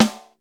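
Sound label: LIT SD, snare drum; LITE KITS; Crypton; DrumBase5000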